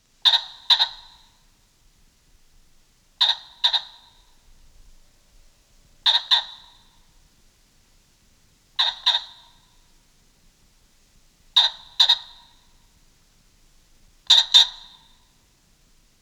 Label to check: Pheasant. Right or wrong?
Right